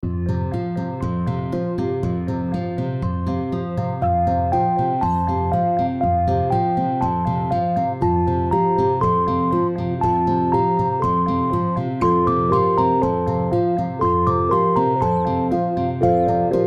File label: music; fire